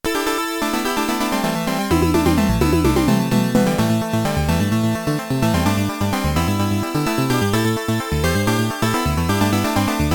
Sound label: Battle BGM; Yie Ar Kung-Fu 2 OST; SCC+ Stereo